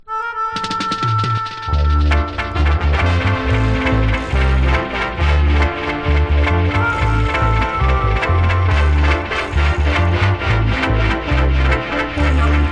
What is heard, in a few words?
melodica fire